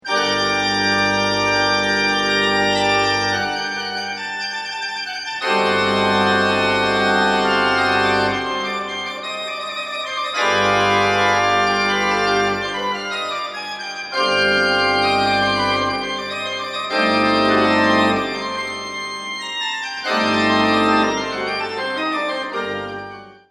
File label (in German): orgel